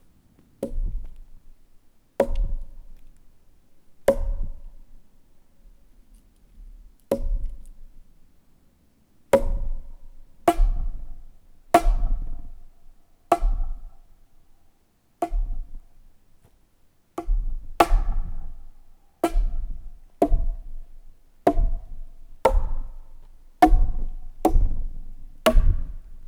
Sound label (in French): Gourdin Buches sac en toile NUIT; Percu Bois